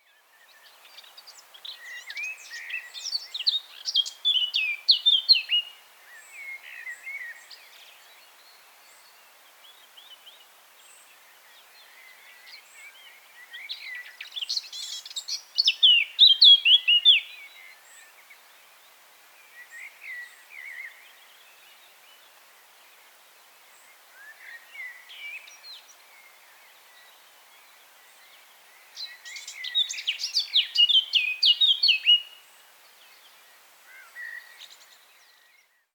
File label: Sylvia atricapilla; SETE